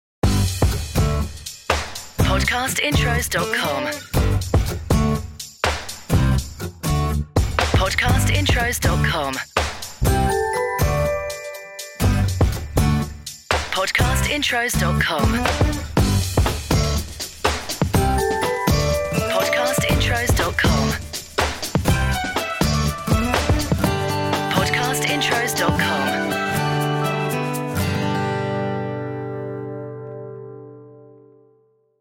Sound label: CORPORATE; Podcast Watermark